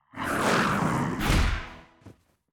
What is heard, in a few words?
cerberos atk